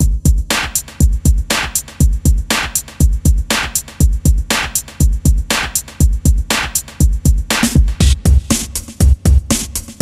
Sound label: Rock RnB